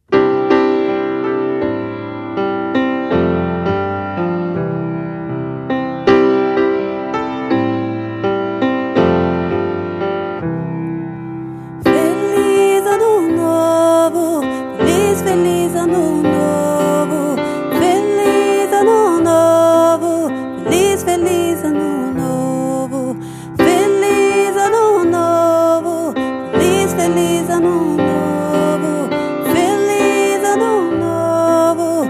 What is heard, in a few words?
portuguese, songs